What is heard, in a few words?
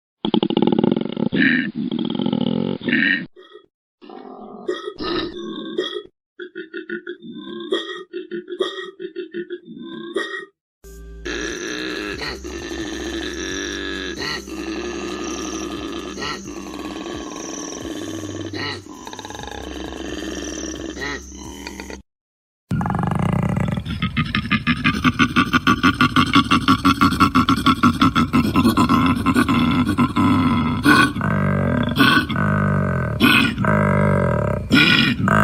Koala